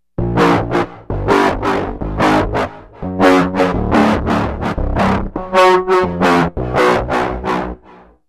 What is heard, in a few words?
oi bass, filthy bass; without kontakt